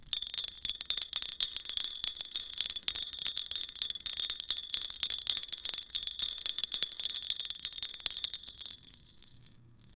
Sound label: jingle bell christmas